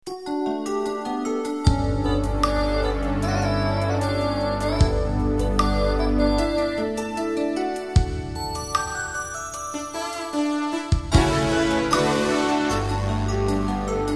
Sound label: Ensoniq VFX SD